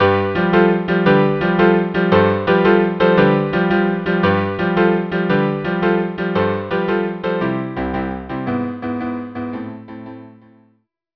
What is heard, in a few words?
string trio; funiculi funicula string trio